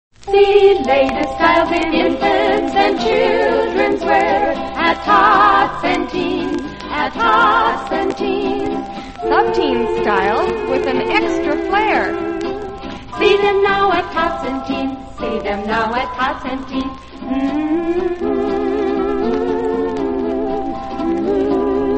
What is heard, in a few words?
radio jingles; tots and teens; allied radio artists